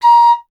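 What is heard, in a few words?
FLUTE PICCOLO A#; Miroslav Vitous - Solo Instruments VOL-2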